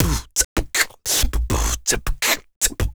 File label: 80, Human Beat Box, Hip Hop; Loopmasters